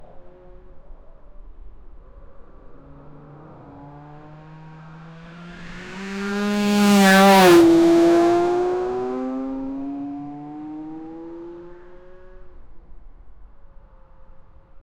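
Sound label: drift car tyre screeching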